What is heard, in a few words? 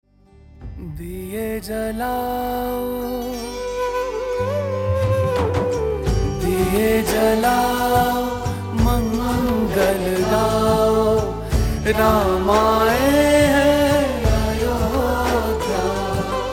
Ram Bhajan